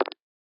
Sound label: Scanner Team Toggle